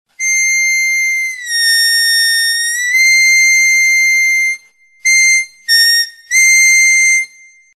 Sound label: bend, harmonica